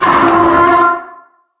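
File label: metal frame groan heavy; Source Engine; Portal 2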